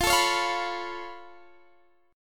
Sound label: C sharp Augmented 7th, F